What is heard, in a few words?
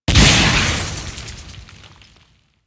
Spike Slam Ability Burst; Enemies; Warframe; Infested; ZealoidPrelate